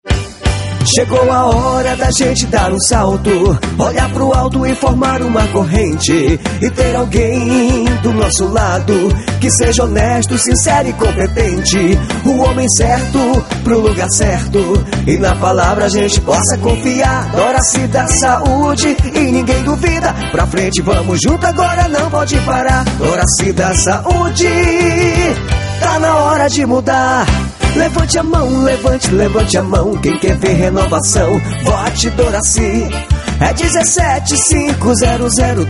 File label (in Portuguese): Jingle politico